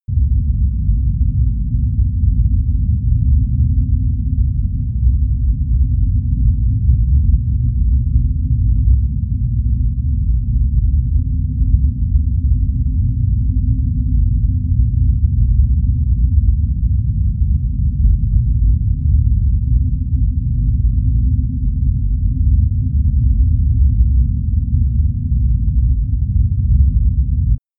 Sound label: Star Ambience; Universe Sandbox 2